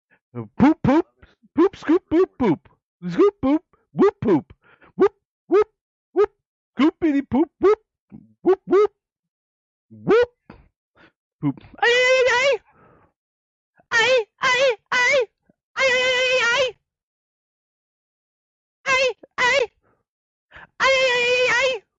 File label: sings